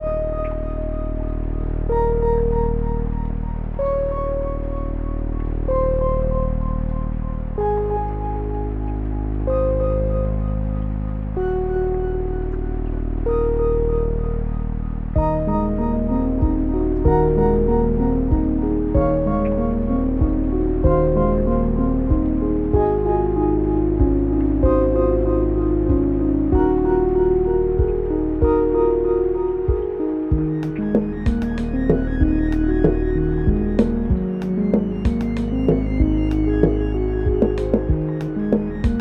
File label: Sad - Rain, soundtrack; Baba Is You; Reed Is Sad